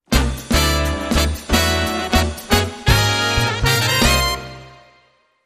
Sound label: Jazz Brass, Jingles